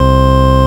VOX, VOX ORGANS; Greytsounds VOL-1

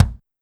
Kick, Clavia DDrum-AT, Pitched Up

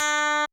kawai k1 STRAT GUITAR; wavetables

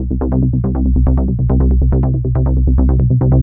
Trance Bassloop 140bpm, Bass Arps

